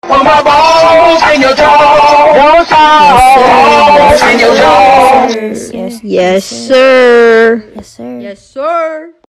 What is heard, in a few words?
rizz sound effect x2